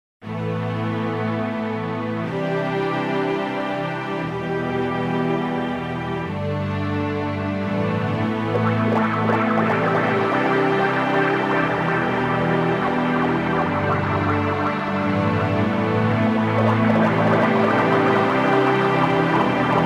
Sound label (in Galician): GUITARRA NOVA